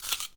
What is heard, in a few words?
Apple Crunch